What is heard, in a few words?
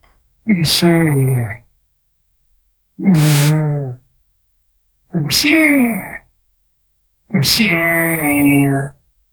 velociraptor trill